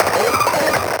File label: 8Bit Fx; Antidote Advent